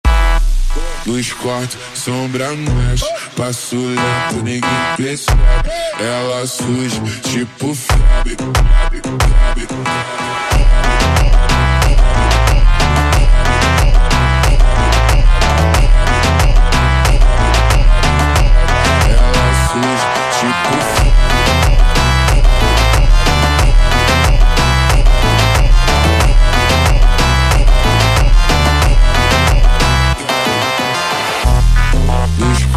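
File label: slowed Reverb, phonk music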